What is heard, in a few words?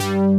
FLEXI BASS; Pro-Rec - Synthsonic; BASSES